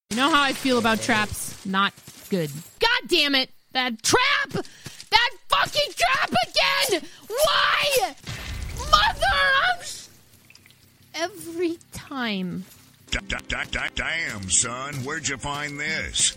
trap

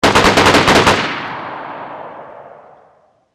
rapid m4a1 gun; dayz

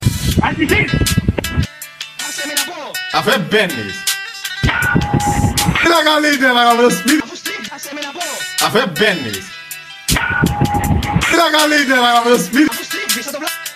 music

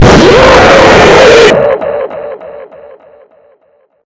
jumpscare sound; project midway joes

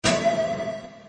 pokeball sound effects

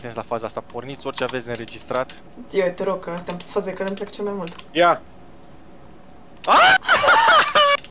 scream; tom si jerry

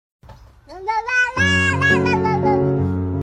sad cat singing

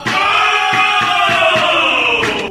double ooooooh

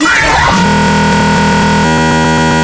jumpscare sound; one nights at remembers

